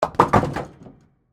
Bowling Pin, Knock, Over, Single, Close, Perspective; Sports; Bowling Sound Effects; BlastWave